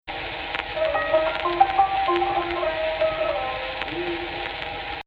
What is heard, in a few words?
AHH, Egypt Rast